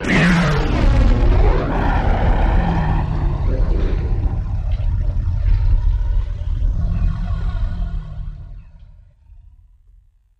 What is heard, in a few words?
Animal Scream Explos, dinosaurs